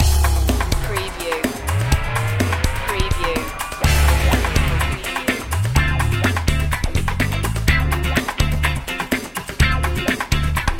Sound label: funky cuba loop